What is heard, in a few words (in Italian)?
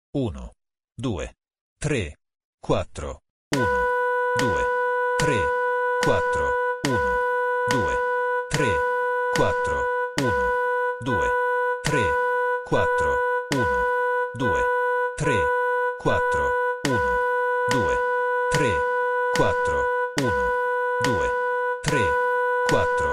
esercizio ritmico; Sonora vol A